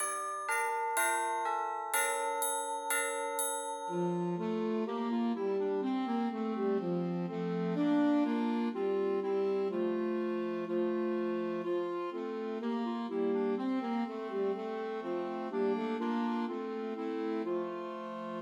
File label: Trios for Two Clarinets and Alto Saxophone; Ding Dong Merrily on High; Christmas